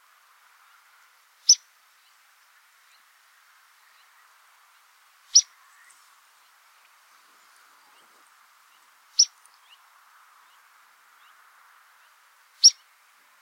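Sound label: ploskonosyy plavunchik golos, red phalarope voice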